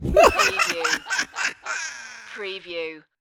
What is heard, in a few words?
Evil High Laugh